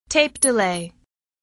tape-delay

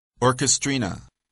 orchestrina

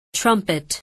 trumpet

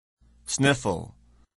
sniffle

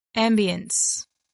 ambience

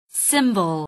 cymbal

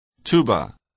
tuba

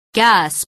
gasp

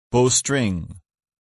bowstring

